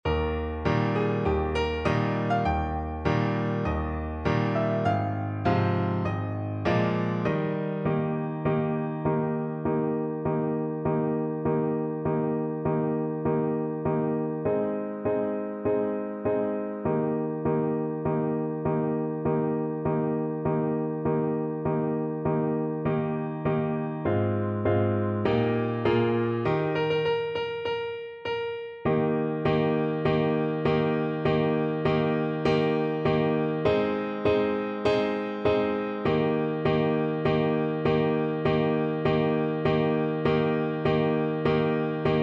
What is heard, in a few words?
Tenor Saxophone